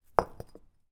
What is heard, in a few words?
foley brick place down on hard ground; zapsplat